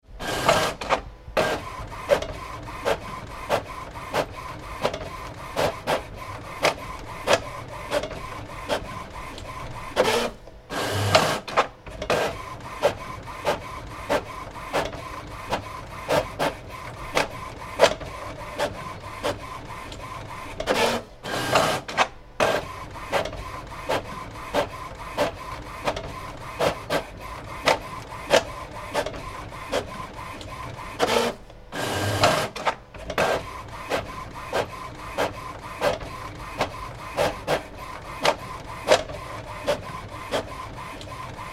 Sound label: Computer Printer, Bubble jet printer working; Printers; Electronics; Tom Hutchings